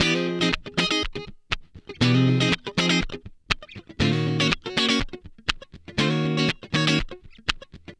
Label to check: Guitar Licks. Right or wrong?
Right